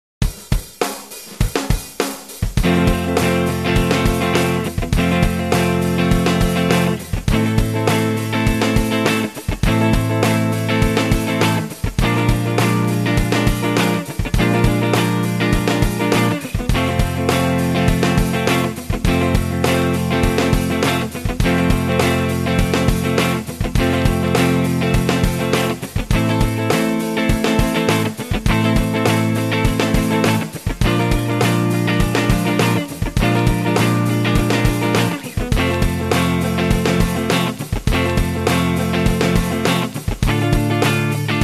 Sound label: Rhythm Guitar